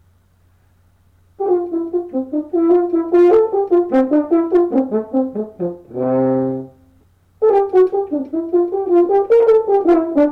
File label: Horn